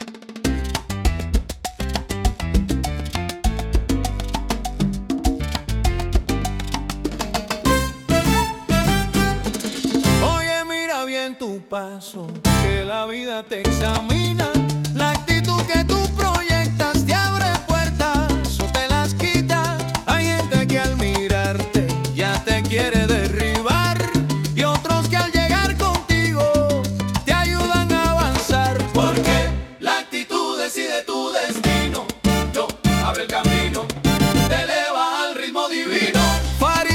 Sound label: Salsa